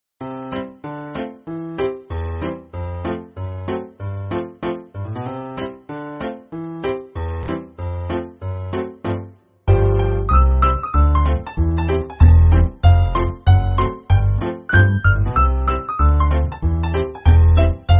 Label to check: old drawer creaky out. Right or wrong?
Wrong